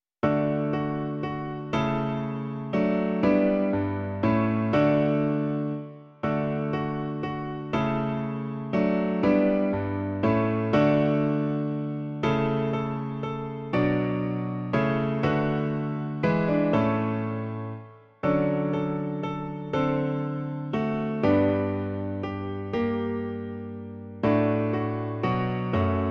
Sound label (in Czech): klavír; Hned zrána děkuj; Klavírní verze